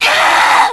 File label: anger; twewy; uzuki